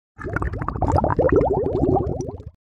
Water Gurgle Bubbles Submergence Comic Water Drain; Hollywood Edge; sound designer's toolkit v2